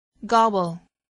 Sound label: gobble